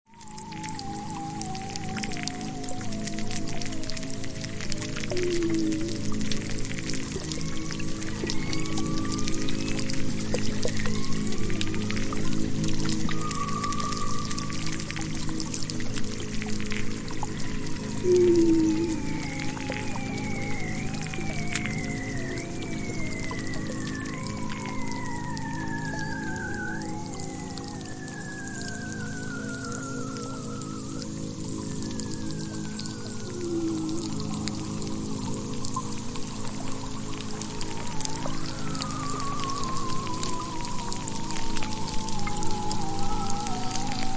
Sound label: Tone